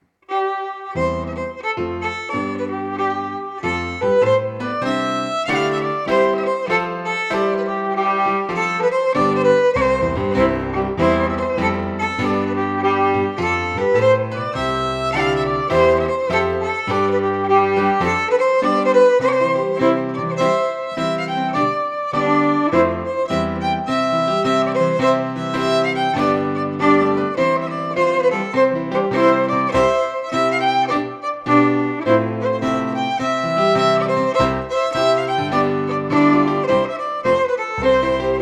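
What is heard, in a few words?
Quick Step, Scottish Fiddling; Morrison 1797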